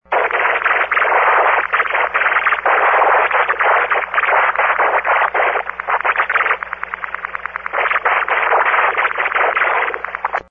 Rolling Code

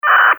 MDC600

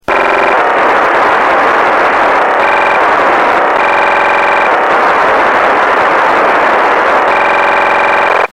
VSELP

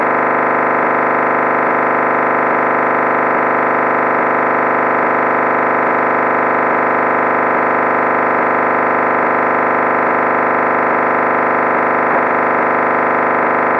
NEXEDGE 9600 CC

